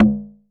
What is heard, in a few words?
LATIN TOM MI, DRUMS; Music Store Sound Service; Einstein